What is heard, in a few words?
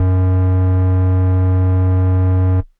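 Air Bass Kawaii F#2; keys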